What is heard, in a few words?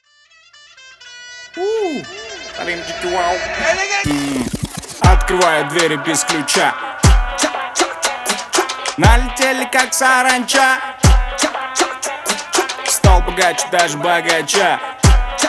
cha cha